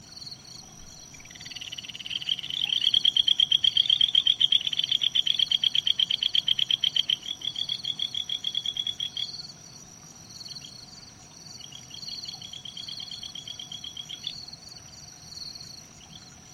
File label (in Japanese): kajika